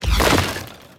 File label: dive